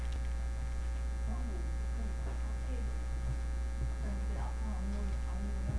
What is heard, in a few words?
bucket